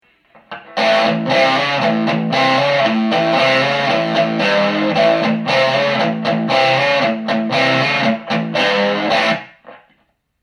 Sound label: overdrive